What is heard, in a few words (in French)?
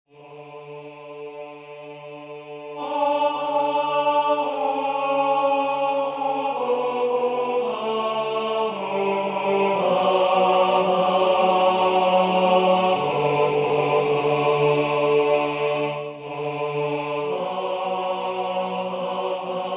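tenor, Musique sacrée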